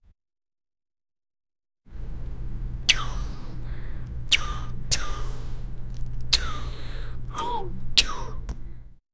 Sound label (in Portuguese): duas pessoas se beijando, romance